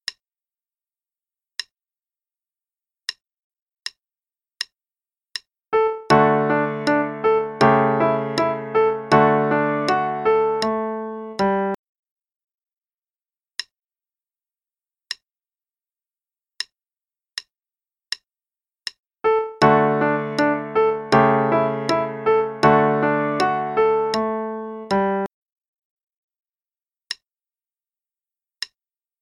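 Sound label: Play-along, Allegro, quarter note=80; Reinagle; Level 2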